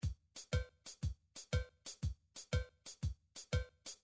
drums irish, midi drum patterns

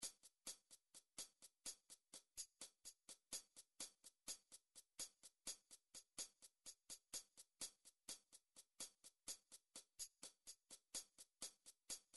drum midi files bossa; Drum Midi Files Collection

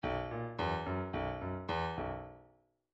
midi file grooves rock

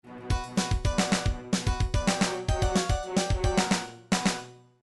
game over; nintendo castlevania

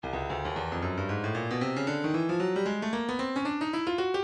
stylus breakbeat midi files 114 bpm housebreak; 110 114 Bpm

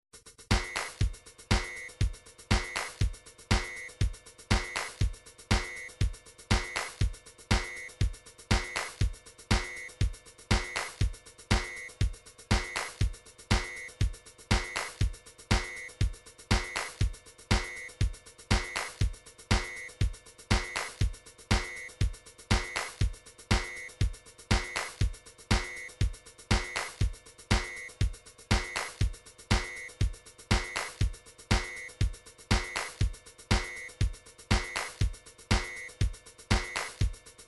drums, midi drums

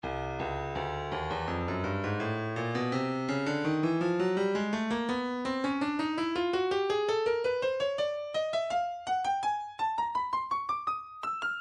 breakbeat 083 bpm; Stylus Breakbeat Midi Files; breakers c

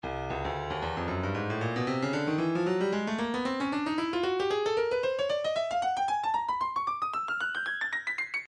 breakbeat midi 114 bpm; Bourbon Street D; Stylus Breakbeat Midi Files

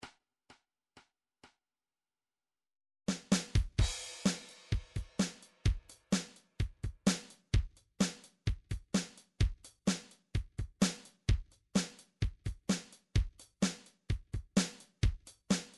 drum trax country 128

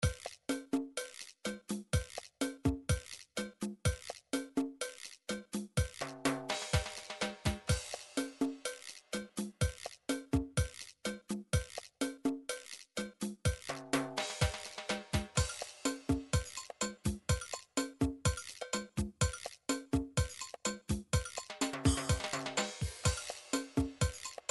drum midi chacha; Drum Midi Files Collection

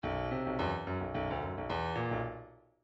midi grooves funk

